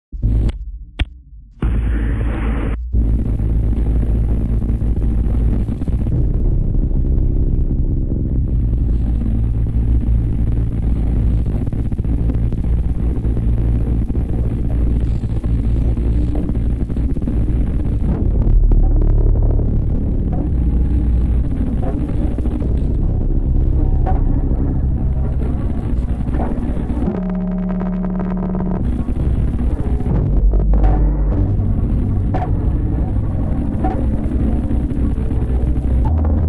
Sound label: train ambient